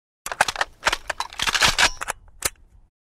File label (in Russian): zatvor avtomata